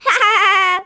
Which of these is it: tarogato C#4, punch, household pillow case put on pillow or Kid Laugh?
Kid Laugh